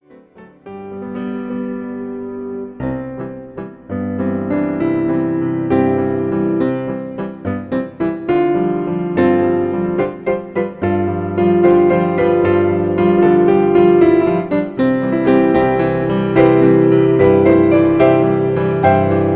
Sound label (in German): Walzer; carlotta